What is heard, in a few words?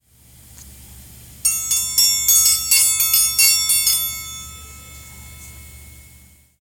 Triangle, Chimes